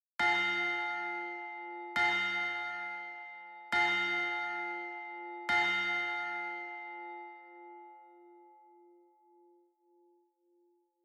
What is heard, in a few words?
bell